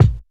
perfect kick D sharp key, Royality free kick one shot tuned to the D# note. Loudest frequency: 189Hz